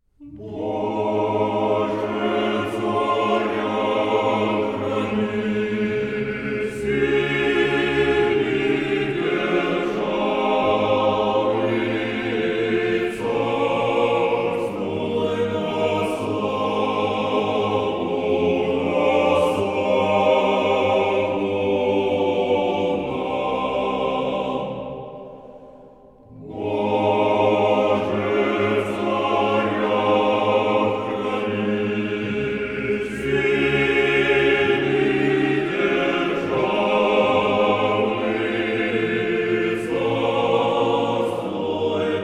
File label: bozhe tsarya khrani acapella; valaam 1995